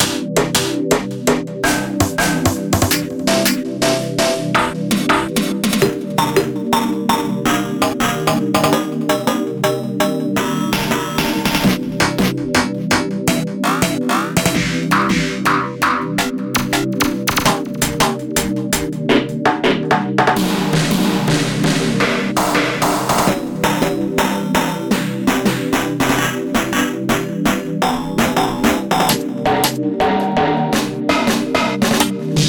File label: snare master